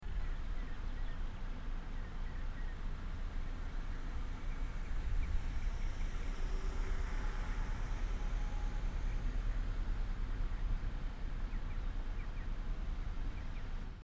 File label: Accord 5 MPH Right To Left Noise Added